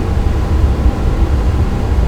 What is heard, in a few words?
vent; Fx; willofsteel